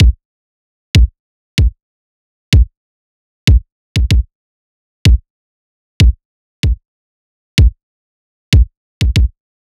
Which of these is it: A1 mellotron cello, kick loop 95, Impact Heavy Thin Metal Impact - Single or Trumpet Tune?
kick loop 95